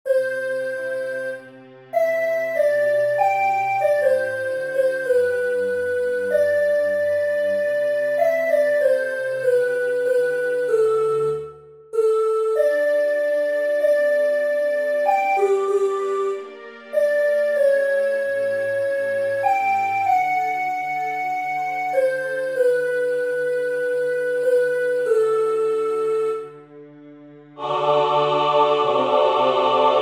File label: Tutti; G.B.Pergolesi; Laudate pueri